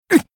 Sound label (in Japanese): 被ダメージ; ビンセント